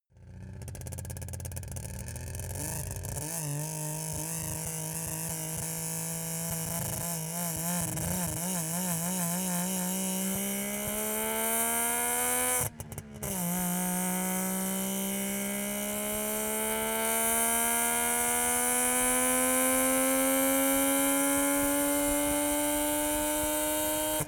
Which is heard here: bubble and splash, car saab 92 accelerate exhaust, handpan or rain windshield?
car saab 92 accelerate exhaust